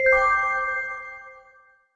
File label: Time Counter; Rally Racer EVO; Android